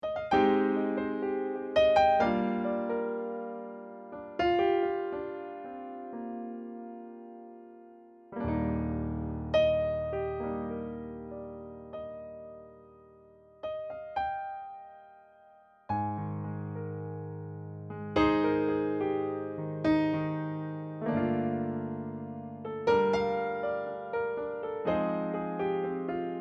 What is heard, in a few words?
Piano Jazz